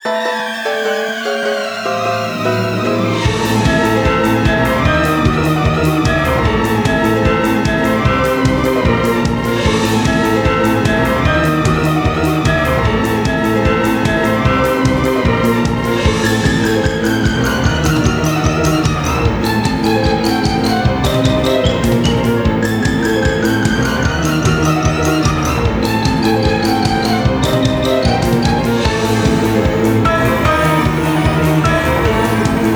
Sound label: Disco; Pumpkin Jack; Vortex